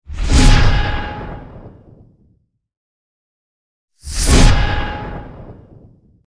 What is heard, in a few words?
Hard Synth Whoosh x2; Hollywood Edge Sound Designers Tool Kit